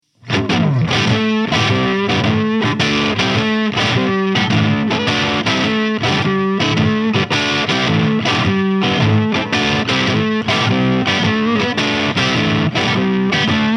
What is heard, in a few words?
Mooer GTRS Guitars Wing 800 Int PB Crunch